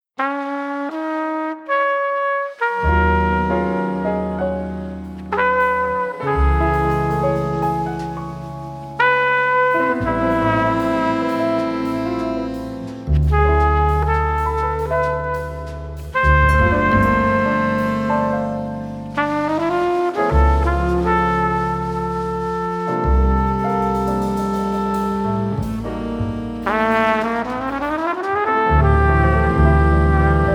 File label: quartet, ballad